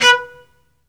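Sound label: SOLO cello, Strings; ILIO; Synclavier Strings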